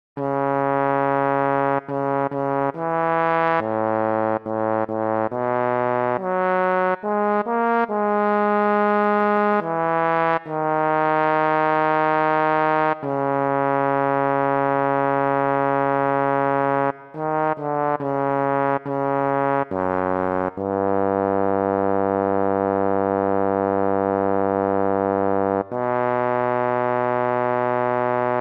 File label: Bass, barbershop